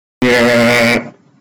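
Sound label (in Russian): смеется; piter griffin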